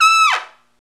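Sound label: Trumpet Fall, Roland; Club-50 - Foundations Roland; Trumpets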